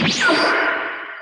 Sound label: teleport; dbz